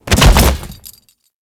Grineer Light Fall, Body Falls; Warframe

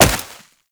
Wall Jump Snow; Footsteps; WallRun; Warframe; Characters; Tenno

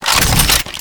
Rocket Launcher Equip; UT Weapons

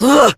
Exert Adult, Melee Swing; en; New War; Adult Operator

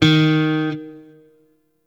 Sentient Shawzin Oct Three D Sharp; Warframe

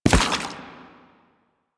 Lasrian Butcher Step Water, Footsteps

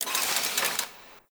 Arcanes On To Off; Interior; Ambience; Warframe; Nineteen Ninety Nine; Tailor Shop

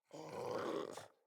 Puppy Sleep Idle Snore; Enemies; Vocals; Kubrow

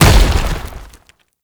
Tank Infested Step; Enemies; Movement; Lasrian Tank; Warframe

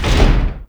Big Door Closed, Doors

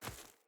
Battle Dog Walk Veg, Footsteps

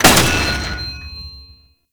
Tank Cannon Mech; Enemies; Weapons; Lasrian Tank; Warframe

